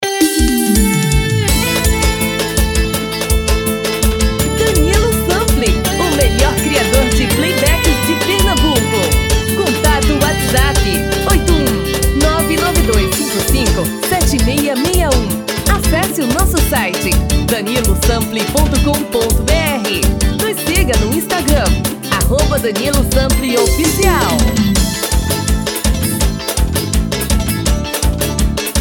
ELETRICO tom masculino

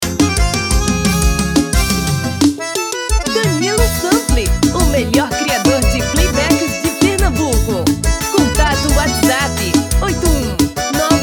feminino

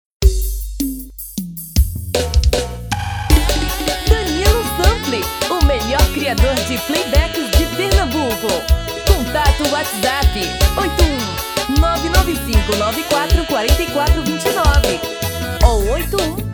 Piseiro

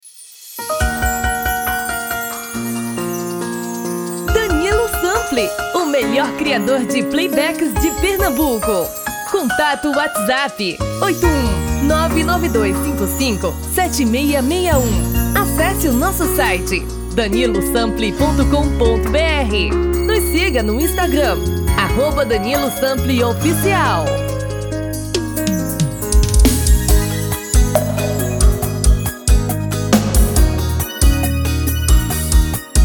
Brega

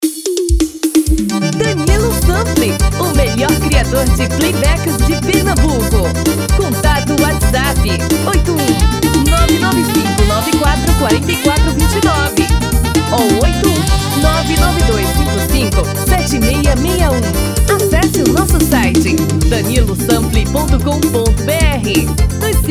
BAIAO

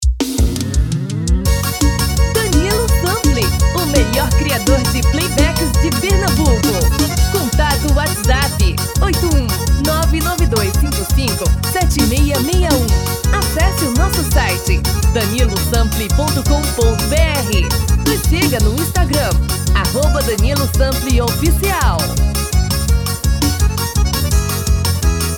dois tom acima

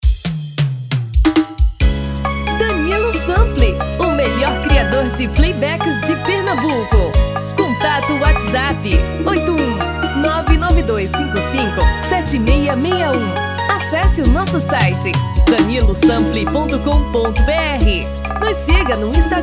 tom feminino

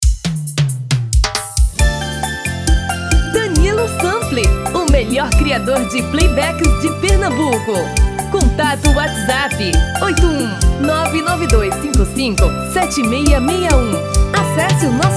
feminino

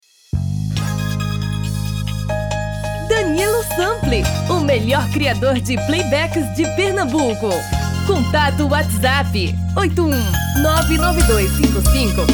Brega; tom feminino

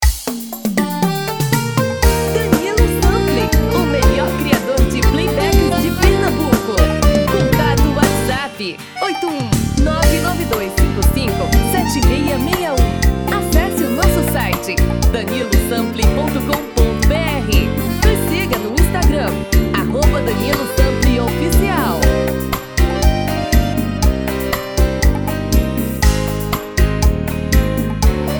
SERESTA

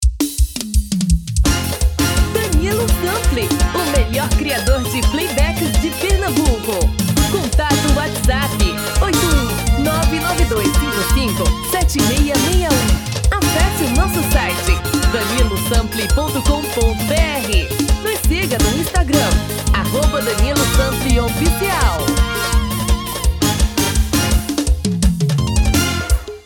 ELETRICO

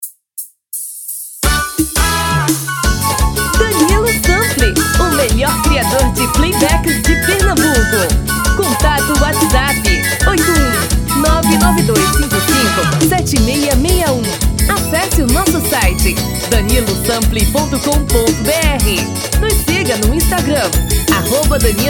Caboclinho feminino

